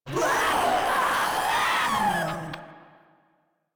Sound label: Proto Infested Vocal Attack, Enemies; Warframe; InfestedNinetyNine